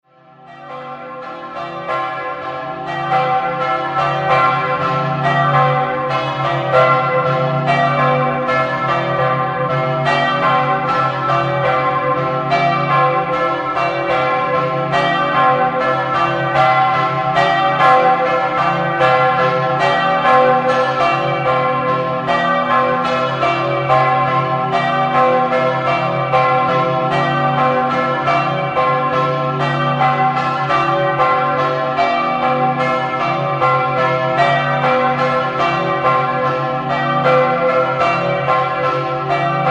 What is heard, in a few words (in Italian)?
FLAIPANO di Montenars, campane